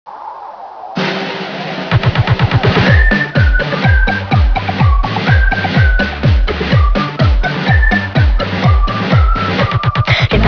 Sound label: bagger music